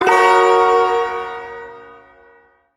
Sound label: Shawzin Hirajoshi Chord; Warframe; Duviri Ersatz Shawzin; Chords